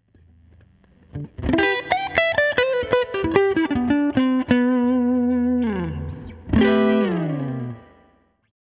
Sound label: hum blade neck